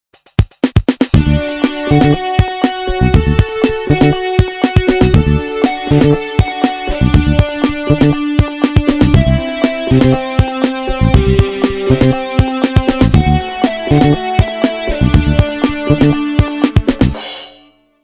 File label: Daily Vlog Music; MacMost Ringtones